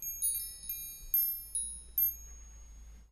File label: wind chimes